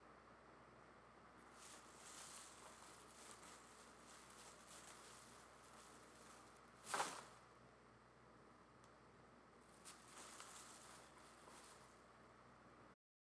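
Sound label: putting things into a plastic bag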